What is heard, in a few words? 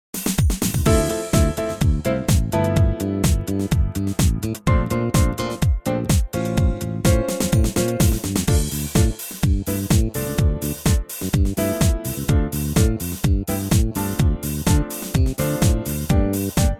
Dm smooth